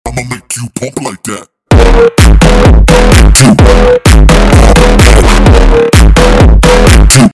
pump like that earrape

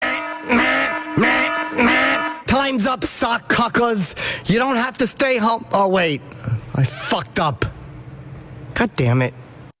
chip alarm

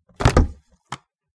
picking up desk office phone

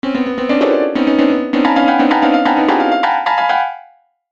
music

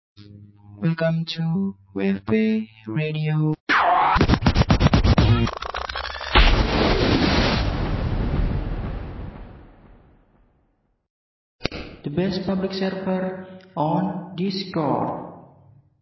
radio jinggle; wfp

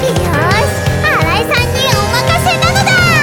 aaaaaaaaaaaaaaa

